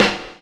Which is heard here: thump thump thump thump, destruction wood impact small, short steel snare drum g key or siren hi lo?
short steel snare drum g key